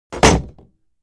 Sword: Impacts Wood; Deep, Dull Metal Into Wood Impact. Close Perspective.